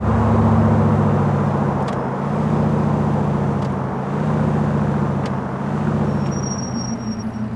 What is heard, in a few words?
throttle off slow, vehicles; schafter